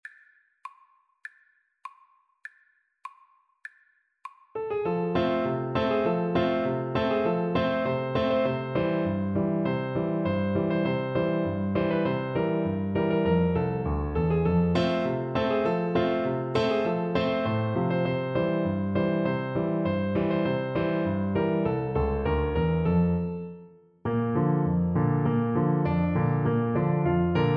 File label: Flute